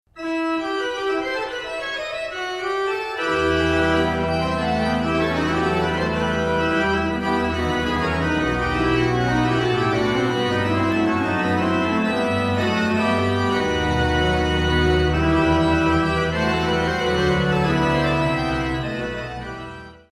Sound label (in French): Orgue